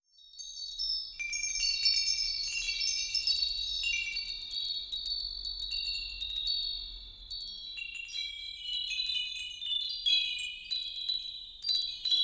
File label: Chimes